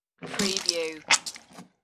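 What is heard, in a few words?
Spitting Liquid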